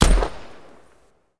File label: chopper shoot